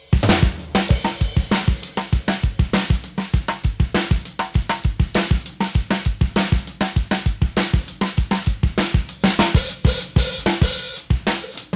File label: drums bus processed